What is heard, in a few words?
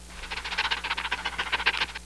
pant